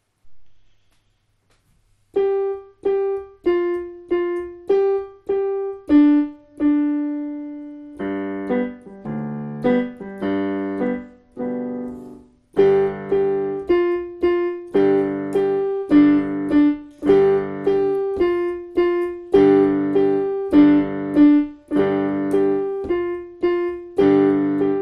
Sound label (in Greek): evga ilie piano